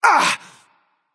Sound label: grunt hit; smite; voicepack; mercury